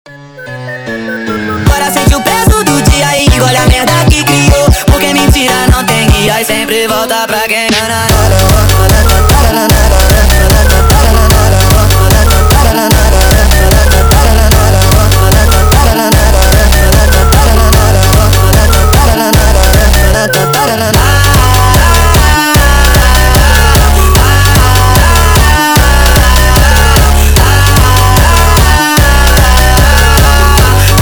MONTAGEM Sped Up

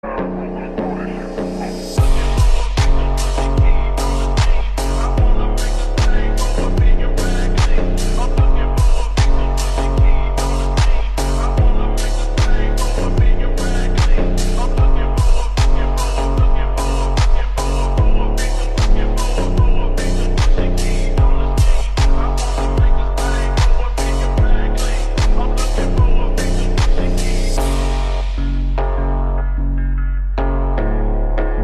Slowed; ringtone

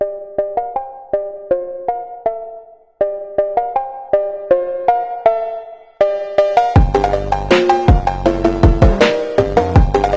Opening PHONK Remix; Apple iPhone; ringtone

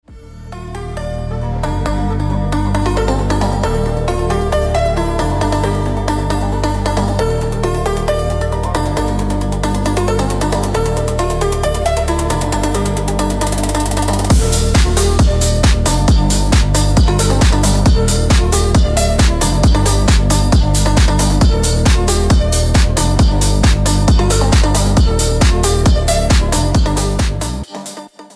Phonk Remix; Huawei Tune; ringtone

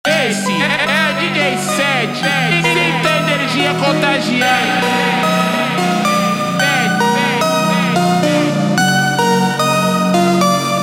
Funk instrumental super Slowed

